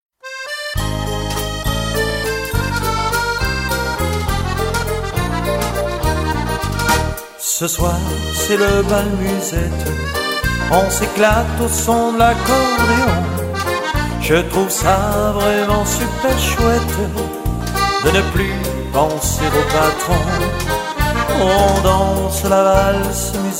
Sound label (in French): bal musette